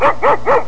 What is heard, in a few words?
3 barks